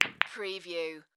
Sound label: Billiards Ball Hit; sound-effects-bible